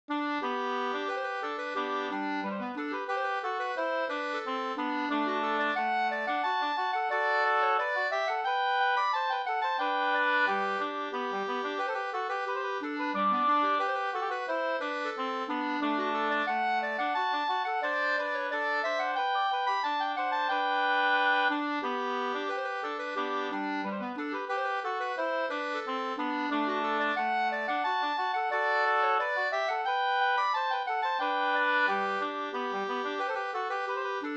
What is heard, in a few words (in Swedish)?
ganglat; Minne fran Flodafors av Ragnar Schelen